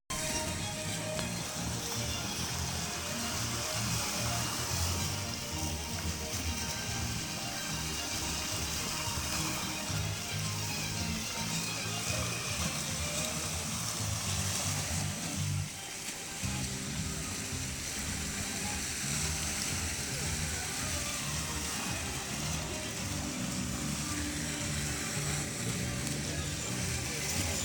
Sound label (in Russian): Фонтаны; На Стрелке